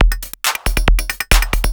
percussion loops 138, Techno; PS-45

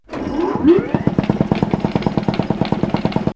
Slow Starter; X-Static Goldmine

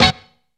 BONES HIT; Zero-G; Funky Elements

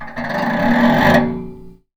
Radiator, contact mic, Metalics

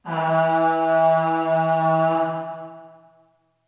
Aah Short E2 Short Reverb; Harmonic Building Blocks

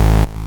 bass techno; Ueberschall; Techno Trance Essentials

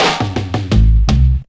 Rollin Pin, Drum Rolls; Zero-G Jungle Warfare 1, 2 & 3; 90 s Sample CDs

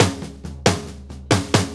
4-4 Fill, Drum Loops

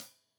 Hat Loose, Hi-Hat, Cymbals Stereo

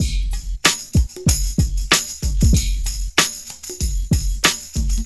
Smooth, R&B Drums

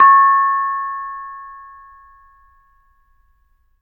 BONANG Db6; Spectrasonics; Heart of Asia

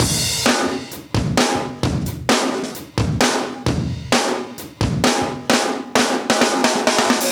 131 Beatz, live breaks

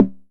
909 SN HI SNPL

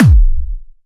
Sub Kicks, Bassdrums; Vengeance; Essential Clubsounds Vol.4

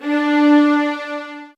D, soft violins; Q Up Arts; Denny Jaeger Master Violin Library

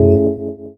Chords Tones; Equipped Music; Loungin House

